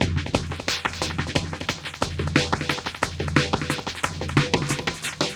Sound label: Phase Loop; Drums & Percussion; Electronic Point Blank